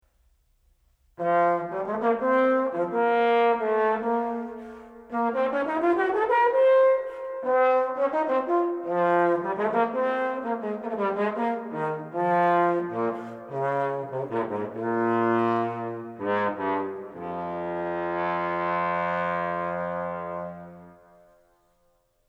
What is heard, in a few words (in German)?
posaune; musikinstrumentenmuseum